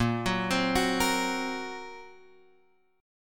A sharp Suspended 2nd Sharp 5th, down, Guitar, Drop D